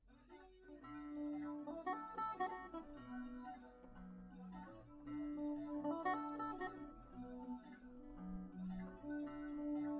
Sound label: nodrums liverec; SiSEC10; underdetermined